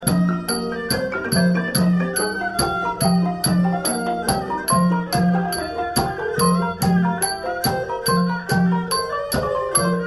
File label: Ching